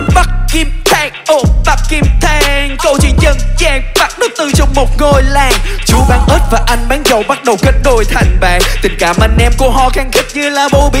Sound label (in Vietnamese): Nhac Chuong Rap; nhac tre